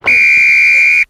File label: whistle